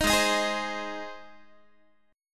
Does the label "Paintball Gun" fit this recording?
No